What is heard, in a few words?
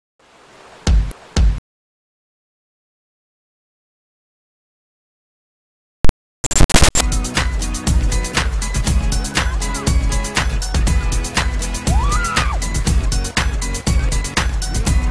MUSIC